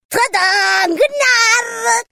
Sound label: Tada; Gnar